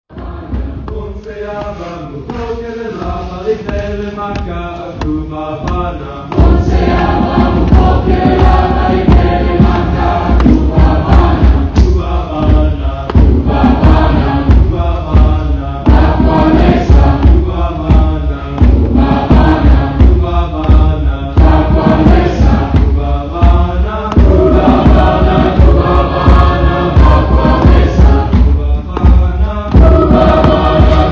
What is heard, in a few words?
Vox; 2023 spring concert